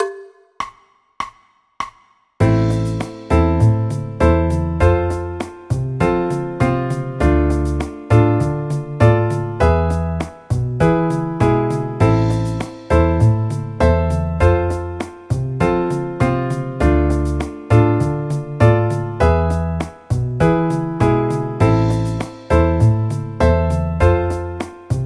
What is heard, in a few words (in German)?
a moll akkordverbindungen